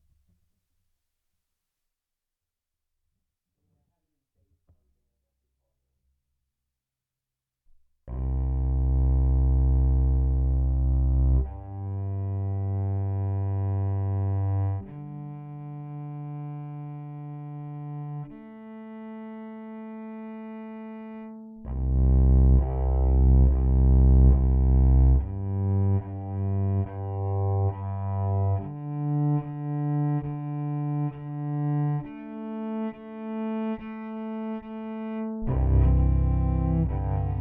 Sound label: pickup direct